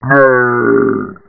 pain; svencoop; uboa; ichyhakase

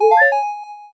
heart; zelda

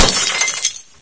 bust glass, debris; mistake coop

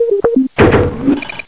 exit door panel; svencoop; echoes

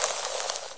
acid, bullchicken; mistake coop

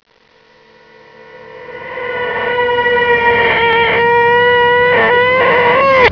sing, tentacle; svencoop; cracklife

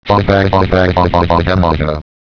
laugh; svencoop; cracklife; hgrunt

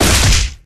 hit; svencoop; gruntscourse

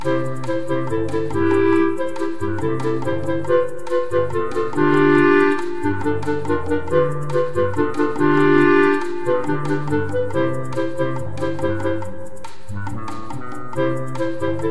shop, music; zelda

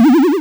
snake spawn; w00tguy; arcade

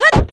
stick attack; zelda; weapons

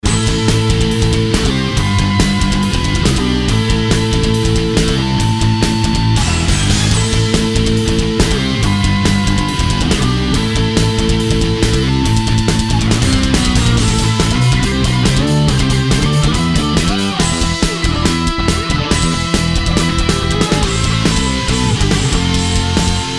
stage1 bgm; svencontra2

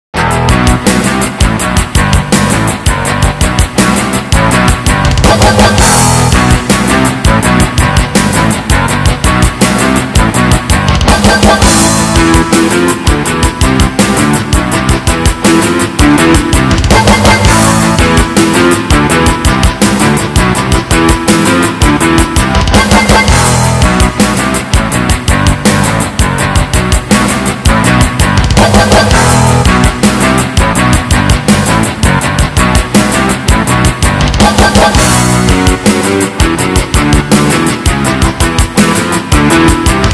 bgmusic; svencoop; classic

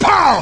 357 shot; svencoop; cracklife; weapons

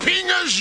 beam start; svencoop; cracklife

